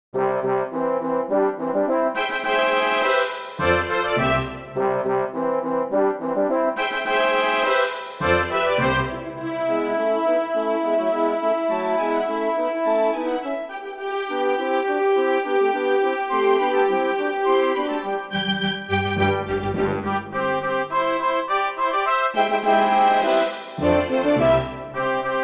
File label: Trumpet Tune